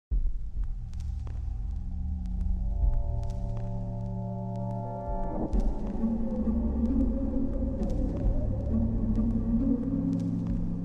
Ambiance; The Angry Video Game Nerd: Game Over 2; Shadow Of The Eclipse

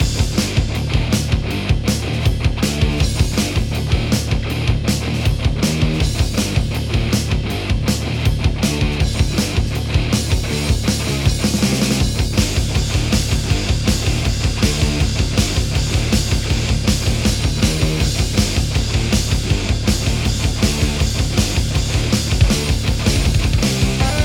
Metal Intense; Chilltheim

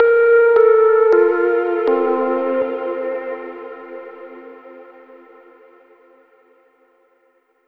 Overlay Tone

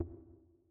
Blink; City SFX; OUTRO

